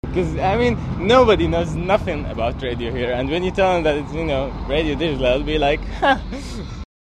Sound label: loop